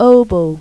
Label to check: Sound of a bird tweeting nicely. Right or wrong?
Wrong